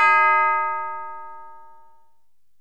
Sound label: grandfather clock, Bells